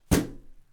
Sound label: Plastic Bag Explode